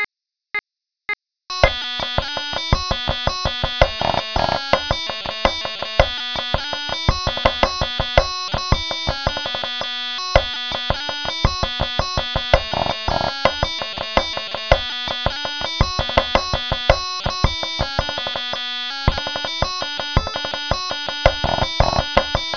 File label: Pipes and Drums 110bpm; McGuire's Jig